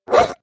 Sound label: Bomb Collision; Angry Birds Space